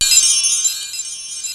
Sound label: DRUMS; Rubber Chicken Software Sample Bank